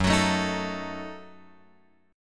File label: F sharp Minor 6th F sharp; F#m6 Chord {2 X 4 2 4 5} strummed